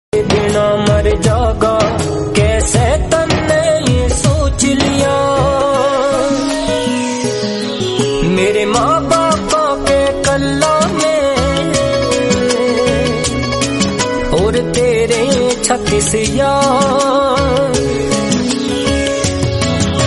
haryanvi song

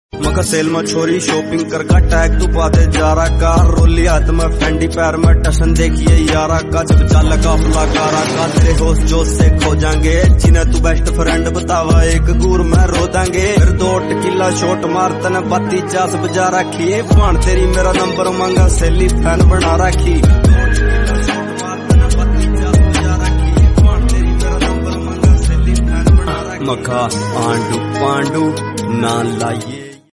haryanvi

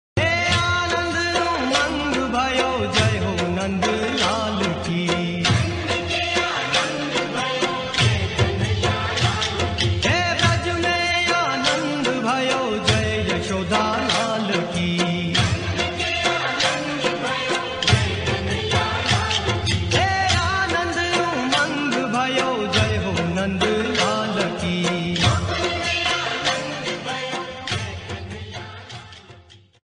hathi ghoda paalki jay kanhaiya lal ki devotional bhakti hindi

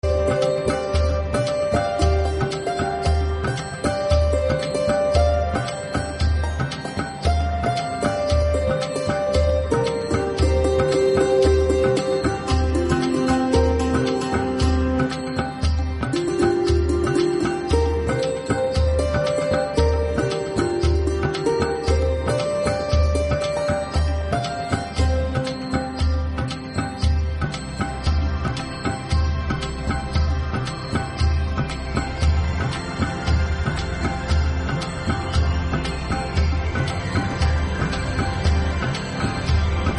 hari bol message tone jai jai radha raman hari bol god bhakti

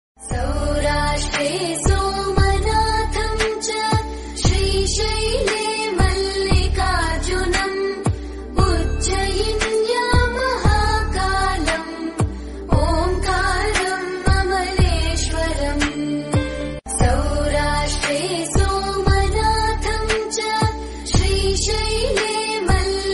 god bhakti; shiva mahadeva

